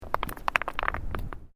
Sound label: Bricks Movement Minor; Material components for constructing more complex sounds